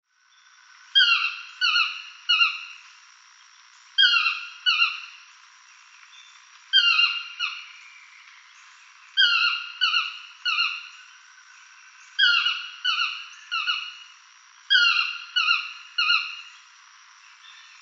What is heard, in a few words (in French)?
toucan tocard, chant